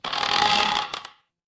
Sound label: door creak